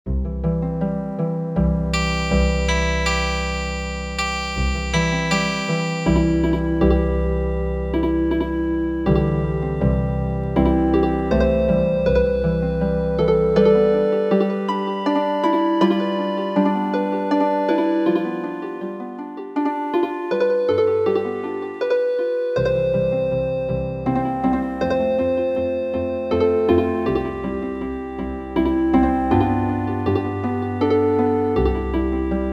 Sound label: Wiegenlied Healing Harp, midi traditional